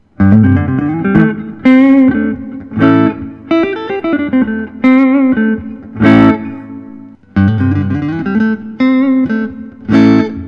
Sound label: prs mccarty 594 10 top